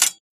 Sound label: pickup vest; soldiersfury